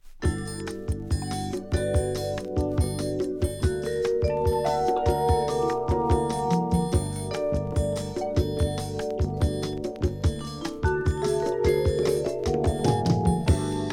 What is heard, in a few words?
violin, VIOLIN COUNTRY ROCK; analogy records/Italy